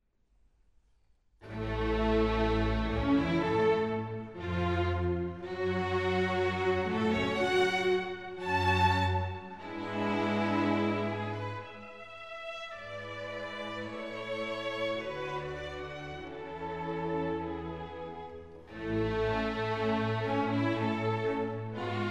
Rondo in A for violin & strings

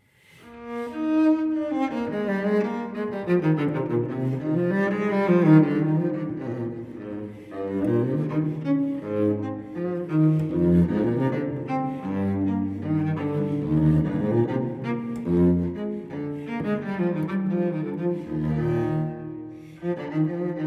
Cello Suite No. 4 in E flat major Allemande; BWV1010; Johann Sebastian Bach

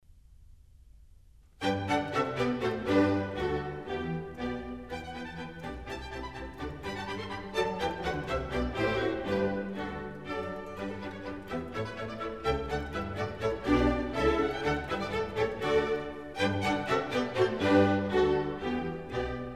Water Music Suite

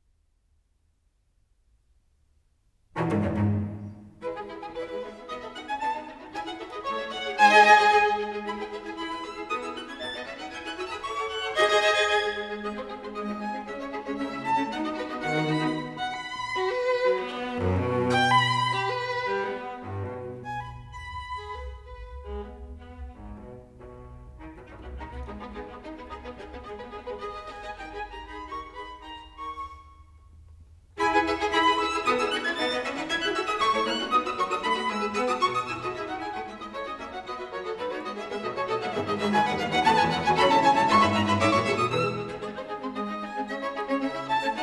String Quartet in C sharp minor, Presto; String Quartet No.14; Op.131; Ludwig van Beethoven

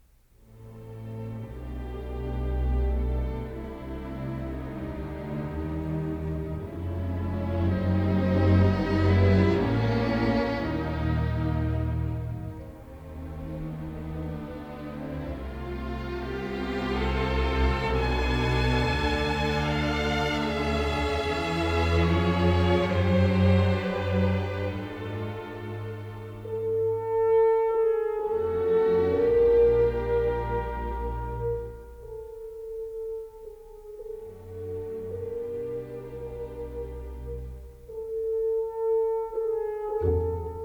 Concerto for Cello and Orchestra in E-Flat Major; Shostakovich; The Philadelphia Orchestra; Great Performances

